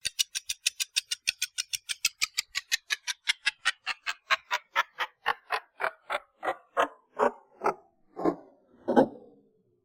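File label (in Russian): часы тик-так замедление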